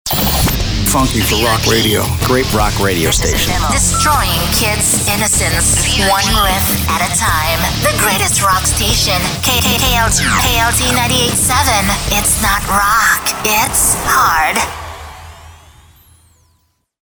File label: ROCK Radio